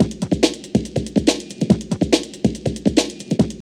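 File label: drum loop; X-Static Goldmine 3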